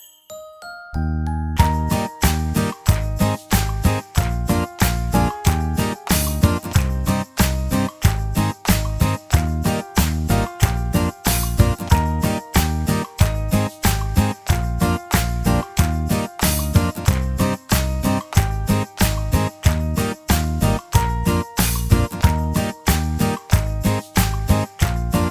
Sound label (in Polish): (WERSJA INSTRUMENTALNA)